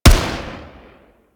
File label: Arch Long Rifle 3D Fire, Weapons; Warframe; Archwing